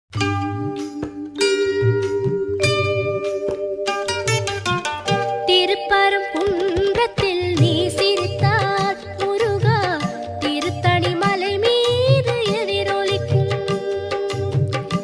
murugan songs tamil tamil devotional song

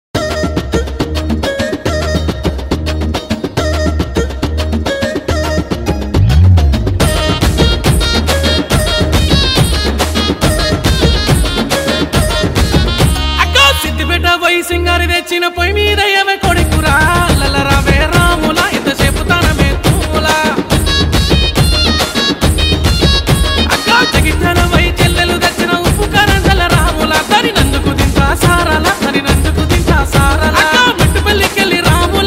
FOLK SONG, Telugu; Telugu Private Songs; PROMO

